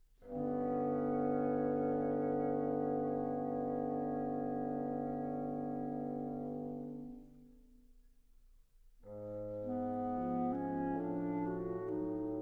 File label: Sextet For Wind Quintet & Piano, Theme and Variations; American Premieres